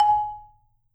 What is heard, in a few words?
G#4, Marimba; hard F; Sampleheads - Dave Samuels Marimba & Vibes